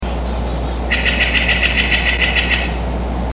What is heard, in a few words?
STJA machine gun call